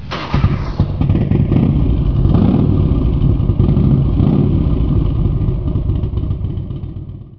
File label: rev